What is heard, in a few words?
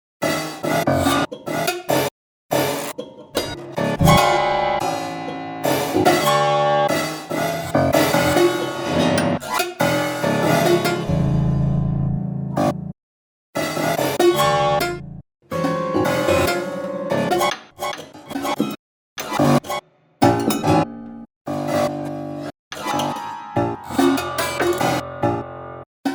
Prepared Piano Piece I